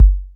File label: smooth bottom end kick drum sample g key, Royality free steel kick drum sample